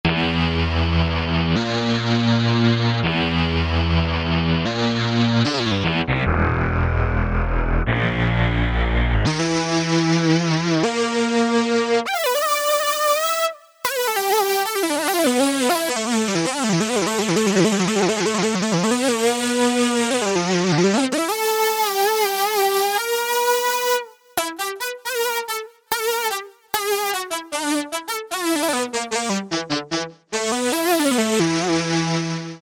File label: Speedronic, E-mu Proteus VX